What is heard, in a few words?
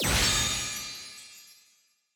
Puzzle Complete